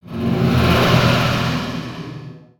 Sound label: breath moan; ninjago